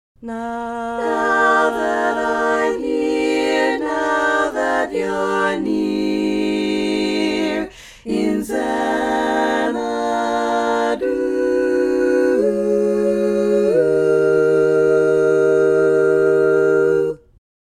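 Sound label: baritone, barbershop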